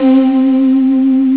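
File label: voice lead, tones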